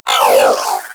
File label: Zanuka Companion Vocal Pain; Pets; Warframe